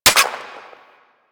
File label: Fire Mech Single, Weapons; Warframe; Gorgon